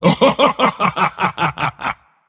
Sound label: mars laugh; dota